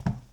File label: ceramic step; Golden Crayon Sounds